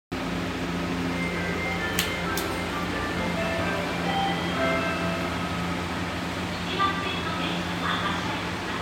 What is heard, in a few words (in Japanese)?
神戸新交通六甲ライナー住吉南発車音, train sounds